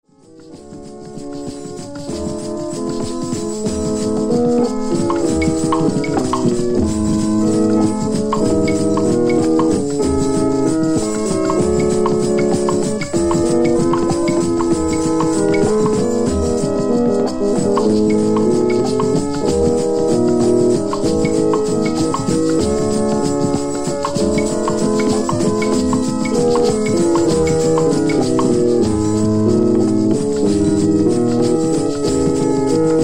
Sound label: spiritual jazz, organ rounds